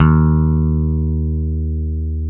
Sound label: BS DANO, Dan-O Bass; Roland L-CD701